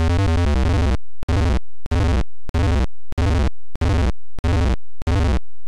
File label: Explosion and Victory Dance; DOS; QBasic Gorillas